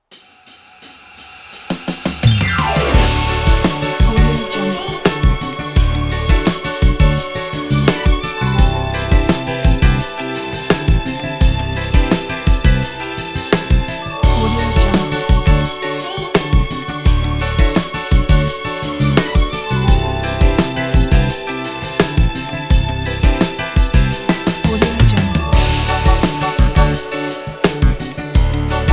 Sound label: disco